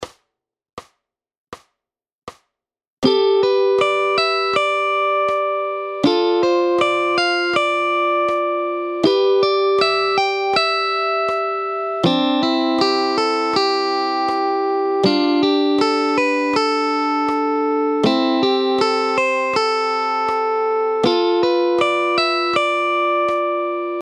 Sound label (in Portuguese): exercicios de acordes na corda 4 80 bpm